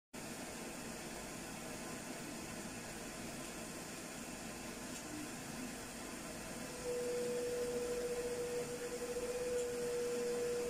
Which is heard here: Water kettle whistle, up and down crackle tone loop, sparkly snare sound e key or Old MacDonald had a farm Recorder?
Water kettle whistle